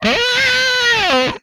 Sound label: death, vocal; retro arcade; MMRetroArcadeSoundsPack